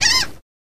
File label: Seagull Chirp Isolated; seagulls